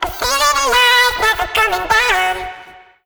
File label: phrase F#m, Vocal; Slate Digital Omnivox Vocal Sample Pack